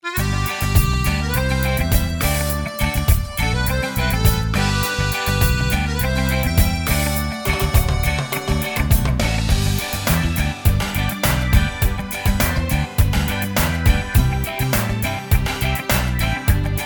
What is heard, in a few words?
REGE, SINGBACK; BULGARIAN